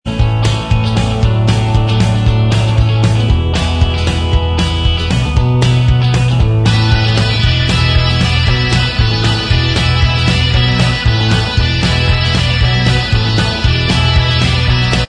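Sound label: Inst Track, instrumental track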